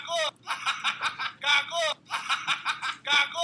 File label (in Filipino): hahaha gago